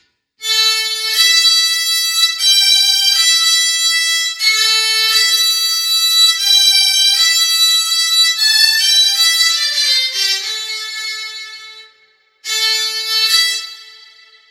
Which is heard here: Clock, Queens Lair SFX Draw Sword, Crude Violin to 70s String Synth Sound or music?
Crude Violin to 70s String Synth Sound